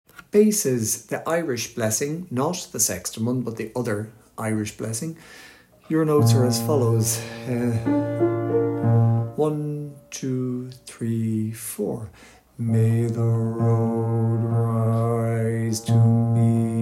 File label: Irish Blessing Bass